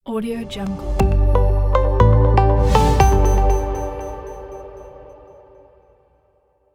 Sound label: calm clean logo; 4restmusic